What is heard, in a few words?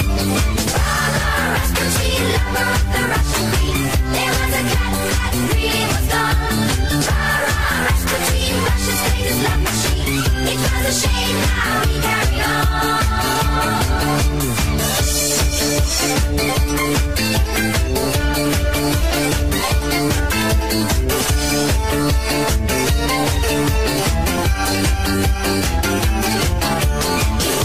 Funk